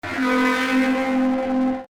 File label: destructive metallic scrapes